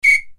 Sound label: whistle